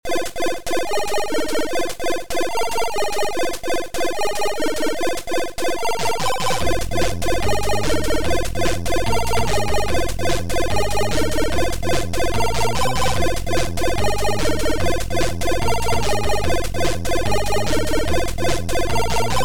Techno Music; N2

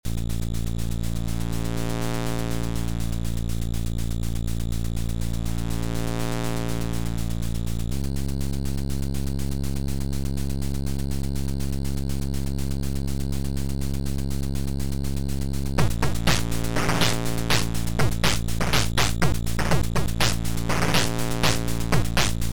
Drum

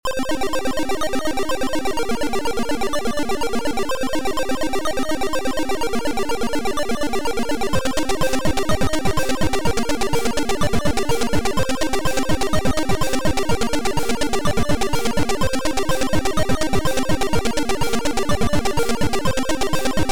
scary wood; jeff

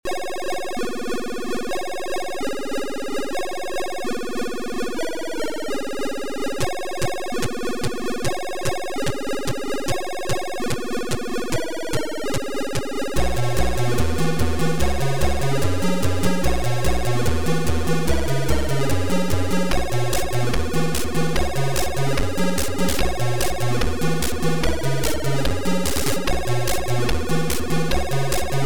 COUNTRY

